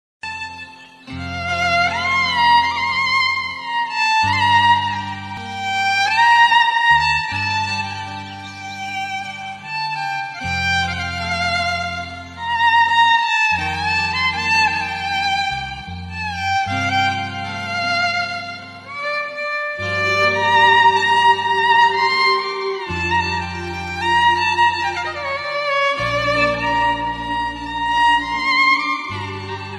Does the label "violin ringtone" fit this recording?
Yes